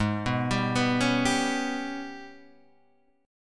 G sharp Minor Major 7th Double Flat 5th, Guitar Standard; arpeggiated; JGuitar